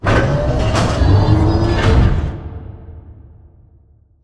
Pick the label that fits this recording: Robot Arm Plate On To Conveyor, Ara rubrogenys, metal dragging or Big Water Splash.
Robot Arm Plate On To Conveyor